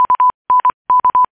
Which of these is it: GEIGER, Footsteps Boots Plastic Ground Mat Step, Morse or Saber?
Morse